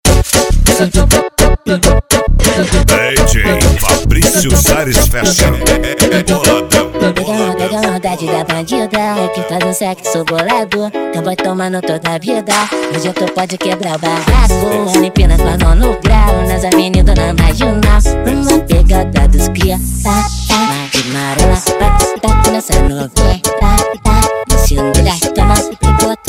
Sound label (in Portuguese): funk ritmado